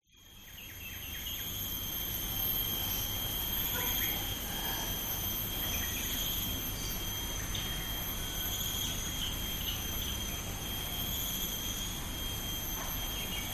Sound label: nature rural ambience pigs chickens birds insects approaching thunderstorm bali; indonesia